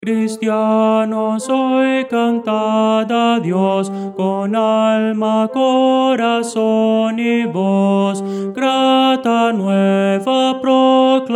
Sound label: tenor